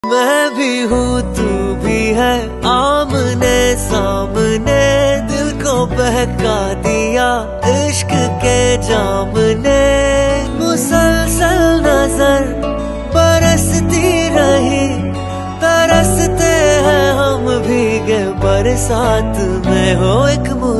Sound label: unplugged song ringtone